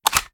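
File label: pistol reload empty fast mag out; gmod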